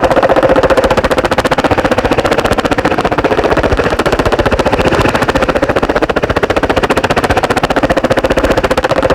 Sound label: Rotor KA50 Dyn; DCS